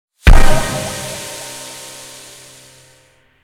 Pipe Open Crash

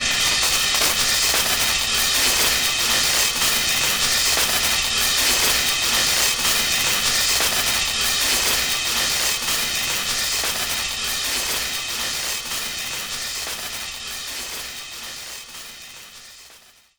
Enemy Factory Active Loop

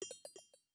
Footstep Stinger; StyxMech

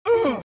Mom Vox Hurt; The Binding of Isaac

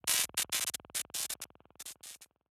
Electricity Status Effect One Shot

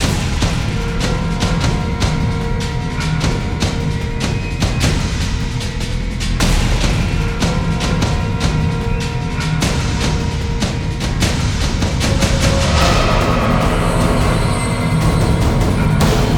Base Loop low

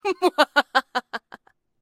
laugh; anti laugh

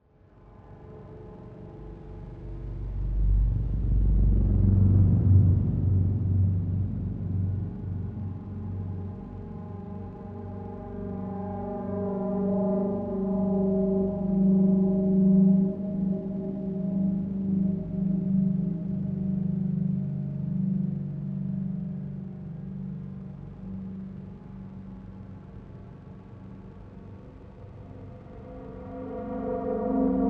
Sublte Dark Horror; Arc Raiders

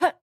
Attack Normal; Jackie Attack Normal Final

